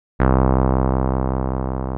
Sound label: TB 303